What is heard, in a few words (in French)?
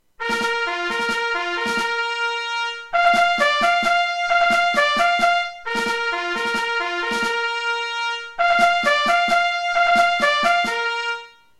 aux officiers clairon tambour